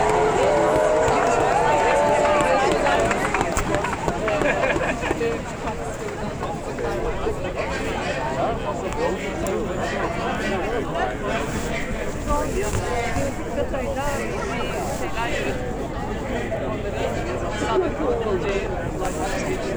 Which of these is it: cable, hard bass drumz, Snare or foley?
foley